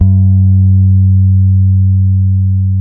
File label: SOUL, Bass, G#3; Spectrasonics; Bass Legends